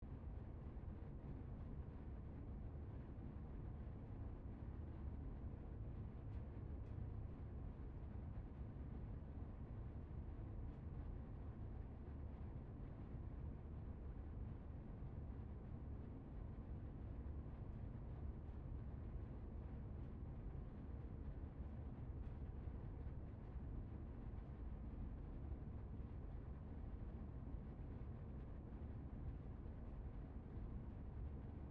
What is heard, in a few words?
Room Tone Hum, Ambience, Air, Conditioning, Loop; BlastWave